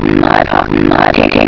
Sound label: mub puh mub dit dit